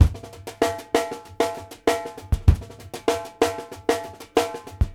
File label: FANCY FILL R, New York City Drumworks, BR+STK 96; Sampleheads; New York City Drumworks VOL-1